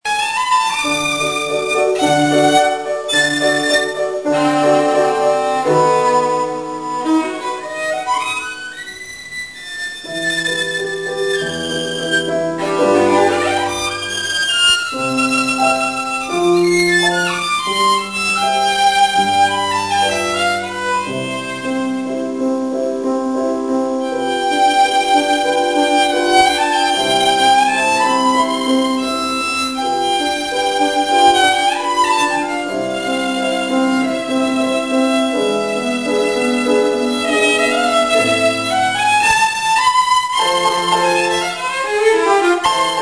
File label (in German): violine